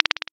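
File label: button, digital, multiple, gurgling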